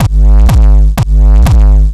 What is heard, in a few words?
TRANCE